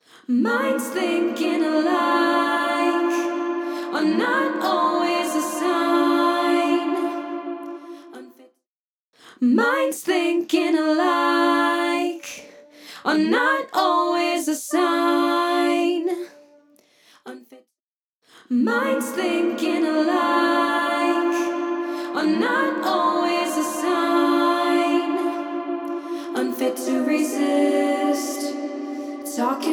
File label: Big Vocal; Physion MkII